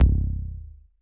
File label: ANALOGUE TOM LOW double plus; drum machines